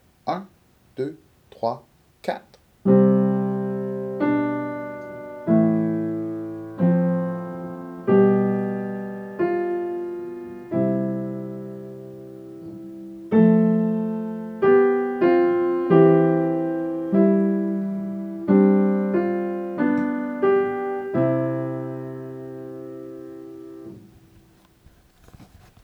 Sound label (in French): solfege